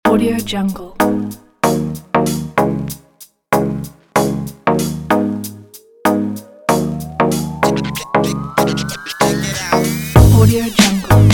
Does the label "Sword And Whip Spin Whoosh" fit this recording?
No